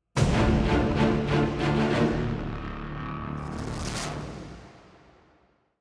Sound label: 190bpm; Legends of Pegasus; windows gamerip 2012